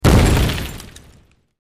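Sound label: Explosion Concussion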